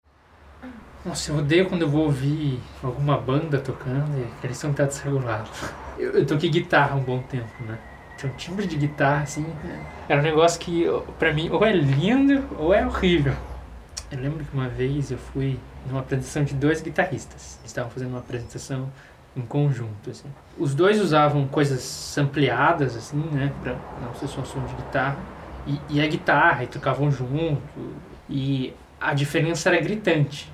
Guitarra Irritante

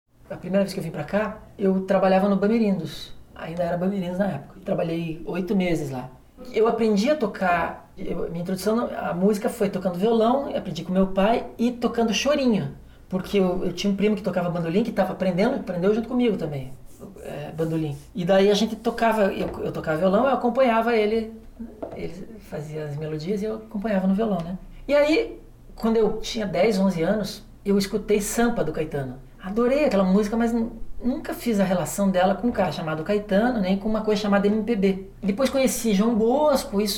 Musica

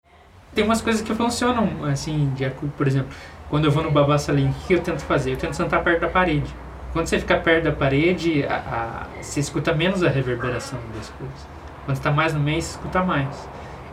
Reverberação

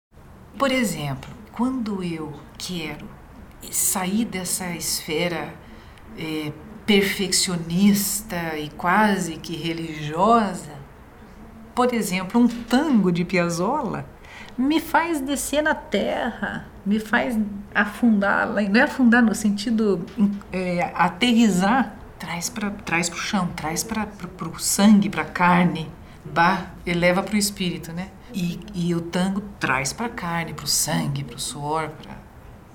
tango

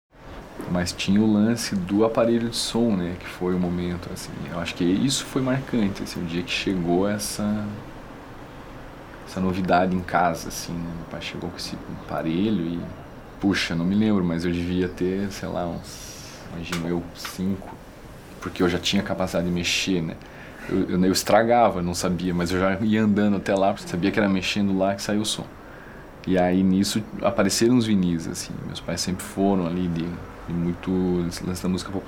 Musica Infancia

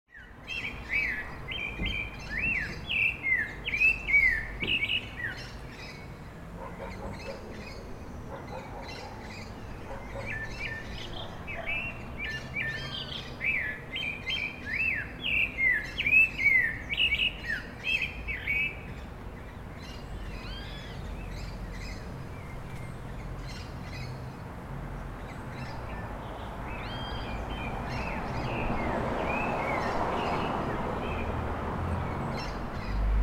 Casa

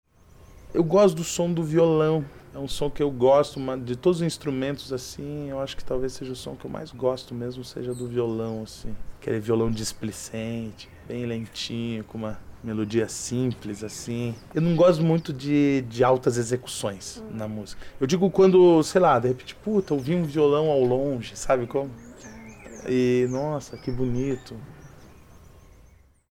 Som Violão

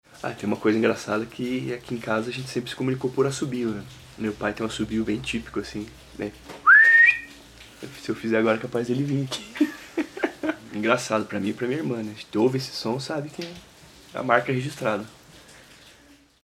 Assobio Assinatura

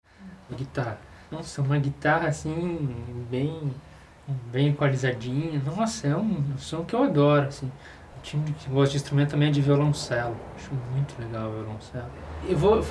Guitarra

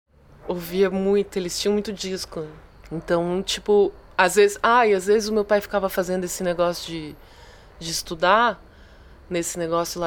Batuque Sob Discos

